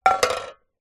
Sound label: food tin can fall over on surface; zapsplat